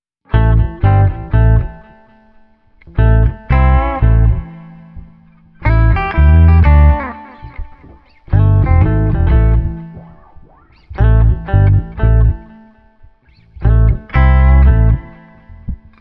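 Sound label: Street Guitars Bayou Groove no Drums, 120 bpm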